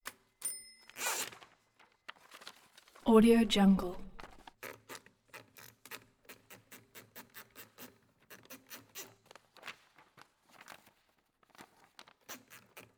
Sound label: typewriter paper 1920s remington